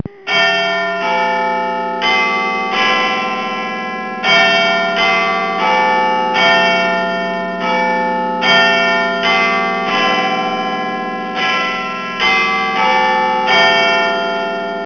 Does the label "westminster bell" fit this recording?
Yes